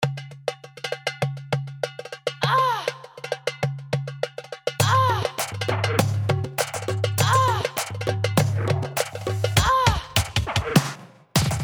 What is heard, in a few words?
new wave dabke